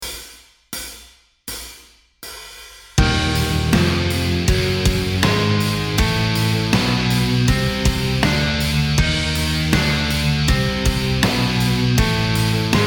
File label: Exotic Modes Eb Dominant Diminished Whole Half Relative D Major; metal music theory